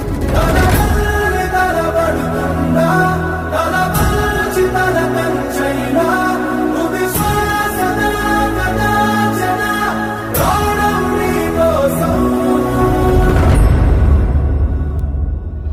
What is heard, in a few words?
Temple Ringtone